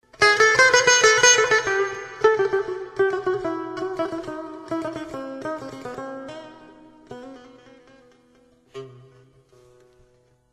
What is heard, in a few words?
traditional ringtone